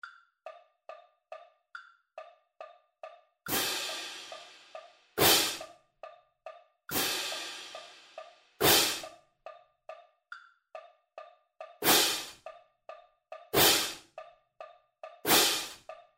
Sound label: Double Beat 140 Cymbals